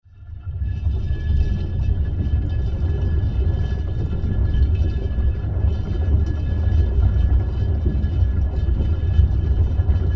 water barrel contact miced

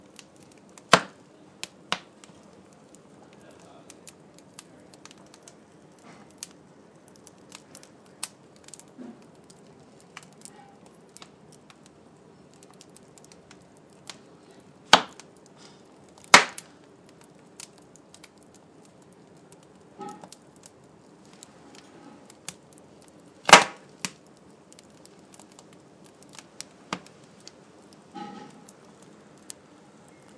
a lovely roaring fire; warm welcome

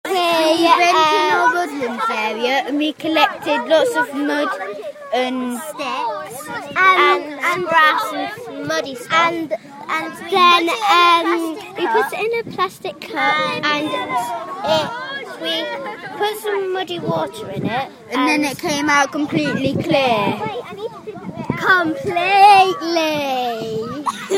filtering muddy water

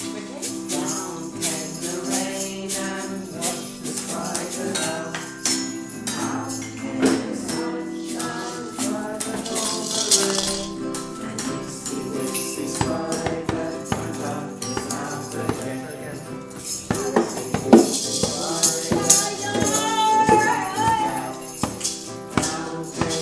orange class singing

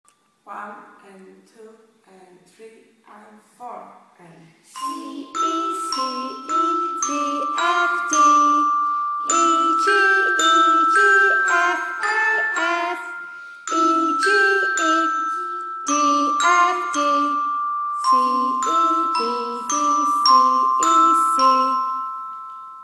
playing the melody

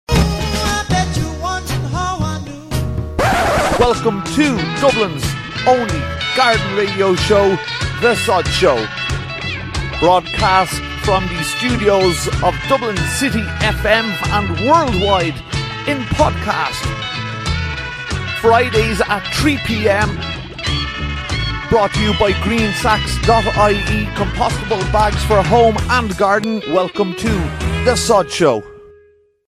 sodshow jingle

